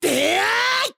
Attack

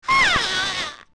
Twinrova Backfire; OOT

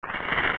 lurk; OOT

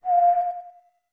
Whistle F med; OOT

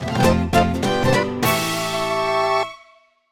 Fanfare Change Outfit; zelda

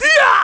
Sword; Rusl

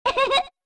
Laugh; Romani laughing

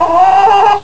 Cucco; Cuccos; Zelda

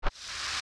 push crawl tall grass; zelda; OOT

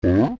"Oop"; Goron; Zelda; OOT

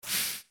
Snow Slice

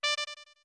Notes Pipes D short

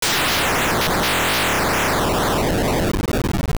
Dethl Die; Dethl dying (regular "Boss Die" plays at the same time)

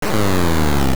Roar; Syger [OOS Mini-Boss 5] roaring; Oracle

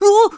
Fall Over; Ravio (unmasked) falls over from the rumbling

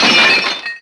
Small Pot Shatter

